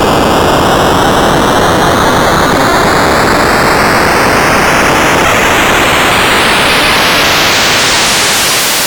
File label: Apple II FX Missile, Chiptune